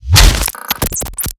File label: Fat Splash; Plastic Surgery